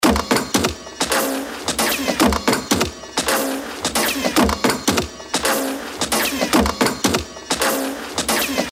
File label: gun loop